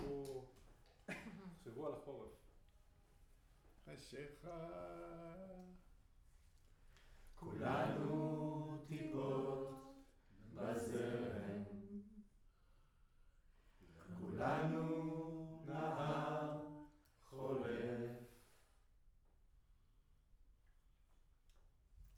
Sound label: Room, Choir